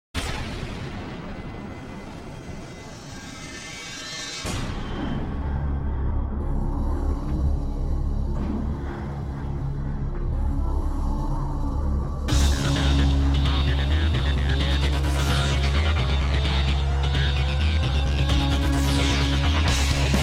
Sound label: trailer music; shadow; xbox